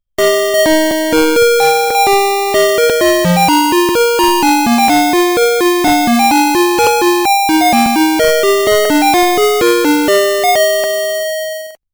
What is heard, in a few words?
Happy new year